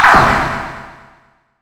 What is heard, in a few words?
Deep, Creature Creepy; Free SFX for anything